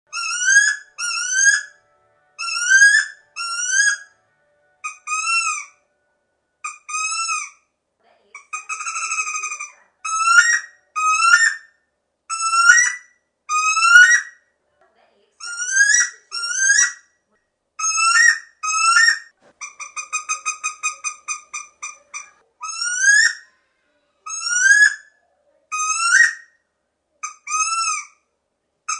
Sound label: woodie call